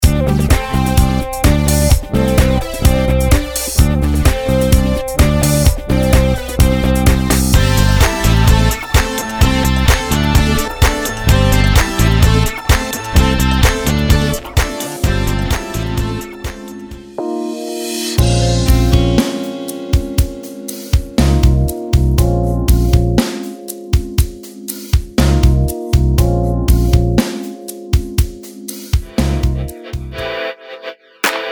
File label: Club Electronica Lounge mix